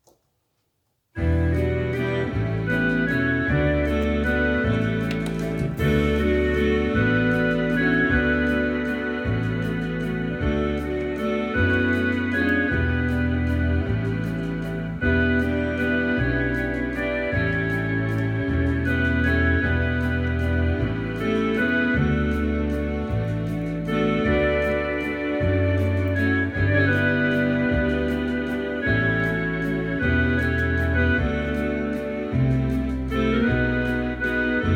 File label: old time waltz